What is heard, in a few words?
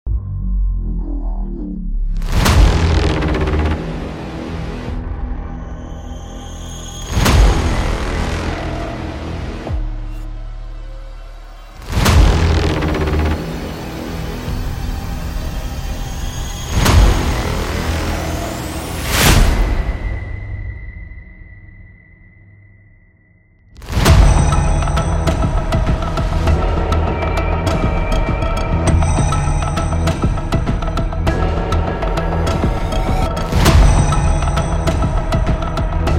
Scary Trailer